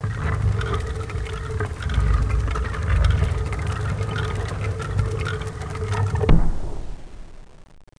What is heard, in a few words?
stn door; Total Chaos Nukem 3d Pack; duke